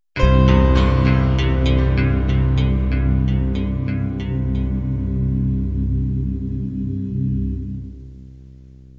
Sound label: country-pop ballad 1 end